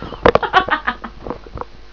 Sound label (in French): RIRE; voix; Nothingness Demo